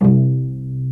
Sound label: PITZ STRINGS